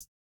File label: no effect j city pop 1980 hihat